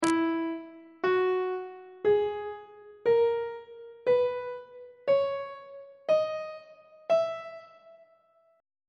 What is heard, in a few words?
e lydian mode note positions on treble clef